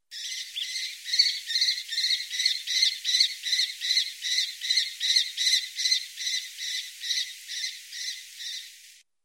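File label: stepnaya pustelga voice, lesser kestrel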